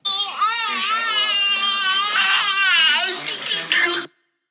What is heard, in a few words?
weird sounds crazed